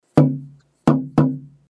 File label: 1.5mm Head Alder